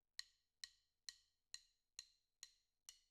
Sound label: Floor